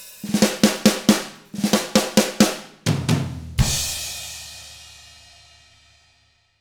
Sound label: SLOW ROCK END, Drums; ILIO; Double Platinum Drums 1